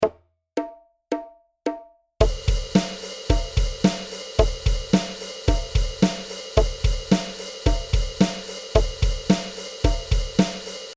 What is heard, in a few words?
Groove